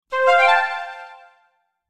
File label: healing sound